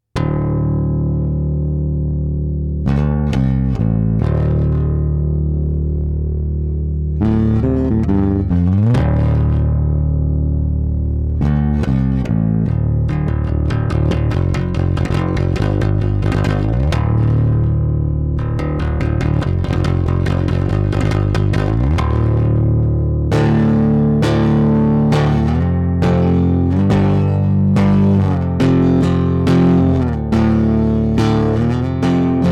Precision Bass aktiv overdrive; Fender Troy Sanders

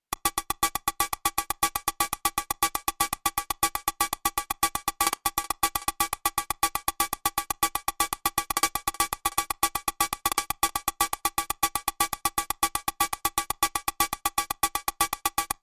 Sixosix Hat; Kobol

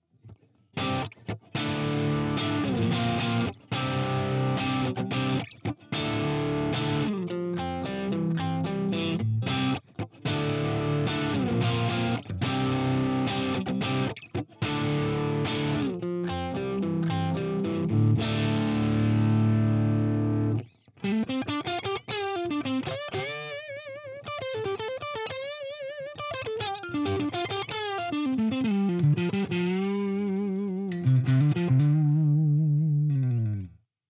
Fender AmPro neck mid DIST

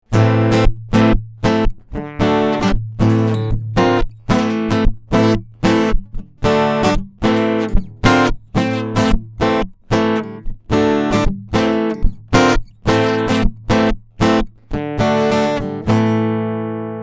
Camden Git

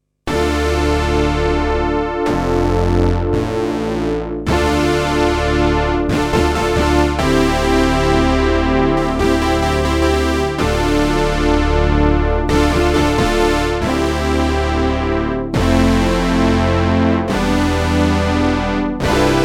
OB brass

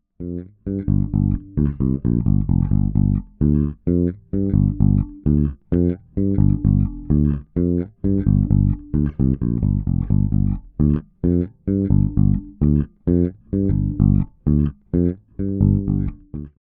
Fodera Emporer Standard 6 Both Pickups

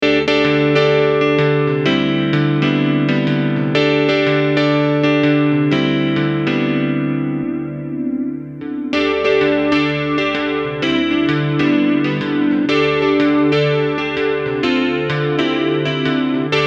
E Grand Medium Chorus; Boss CE-1

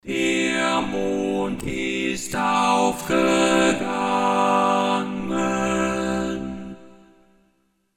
midi lead; tc helicon perform ve

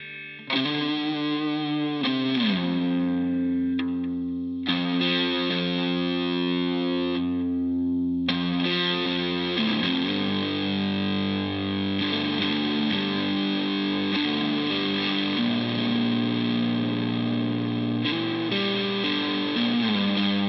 Headrush MX5 Amp MXR Dist

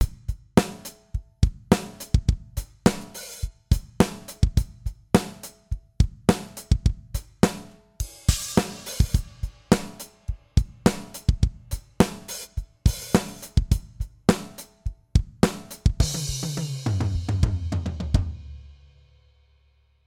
Heritage Audio Successor slow 100 SC off

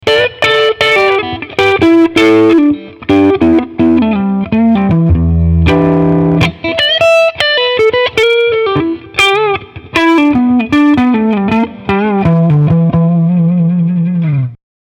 Mild Crunch

